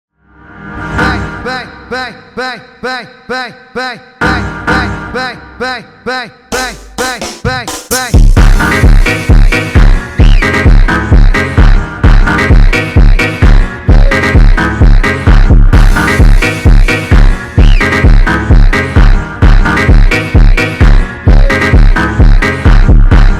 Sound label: automotivo rally house